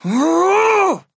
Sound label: effort melee big; Trapper